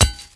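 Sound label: grass; Mafie 1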